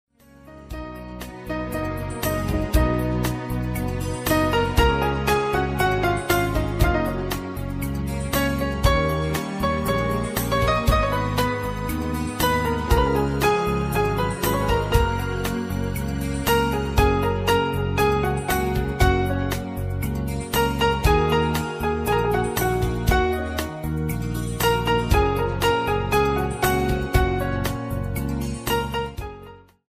Instrumental

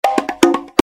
Drum ZTE Notification Ringtone

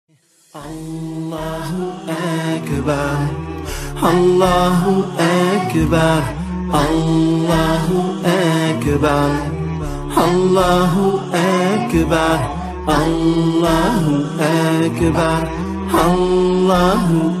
Beautiful islamic Nasheed Ringtone

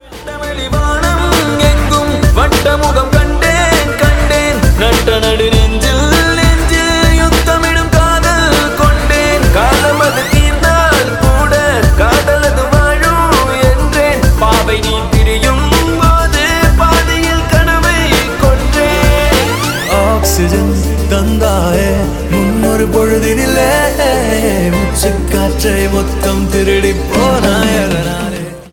Tamil Ringtone

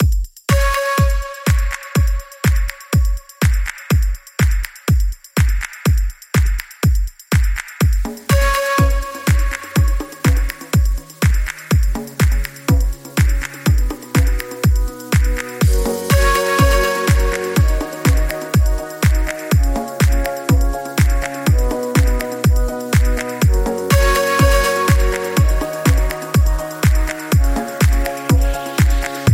Ringtone For Huawei P20 Pro

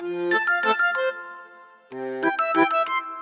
LG Q8 Original Ringtone

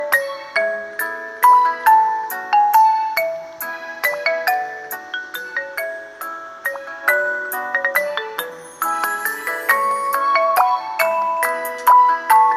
Vivo Original Ringtone; Greenery

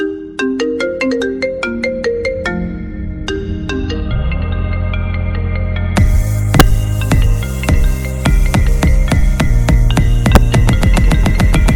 New Remix iPhone Ringtones

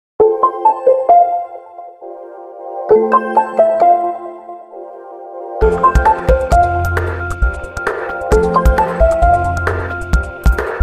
Oppo A58 Original Ringtone

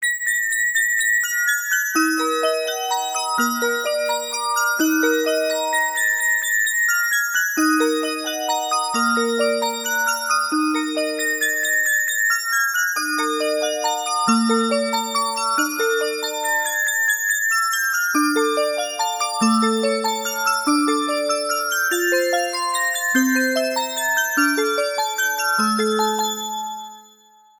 Music Box Ringtone

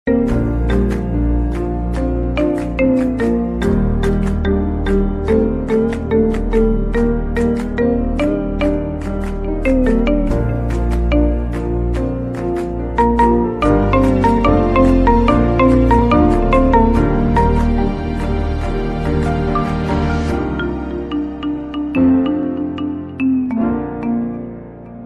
Marimba Remix iPhone 13 Ringtone

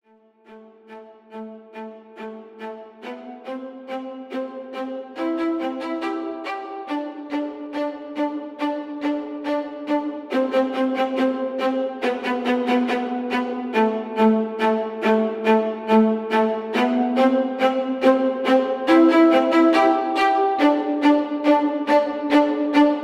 Cool Violin Ringtone

